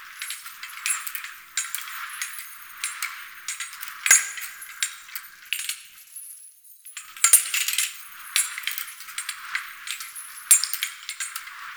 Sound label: Thurible Loop; Lyon; Tau; Tau Circles Of Hell